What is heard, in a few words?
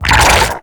Exertion Light; Evolve; Gorgon